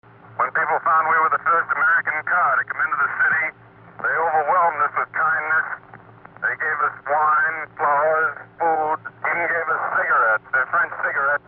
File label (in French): foule